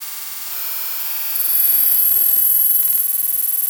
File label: Digital Buzzing Rattle Glitch